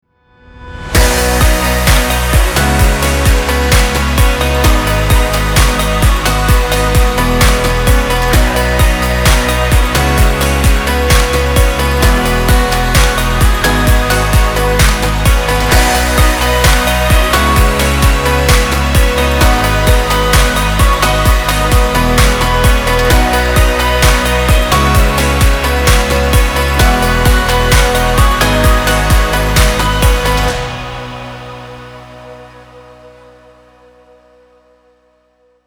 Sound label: upbeat corporate; 30s